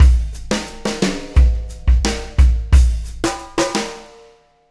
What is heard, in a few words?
Beat, Loops